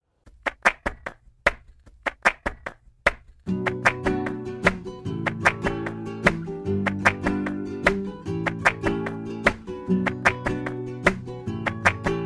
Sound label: rumba